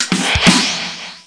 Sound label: close Encounters; Word Wizard Games